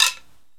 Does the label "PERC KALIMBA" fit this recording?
Yes